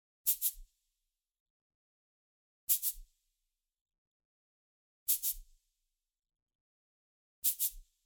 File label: Cabasa Real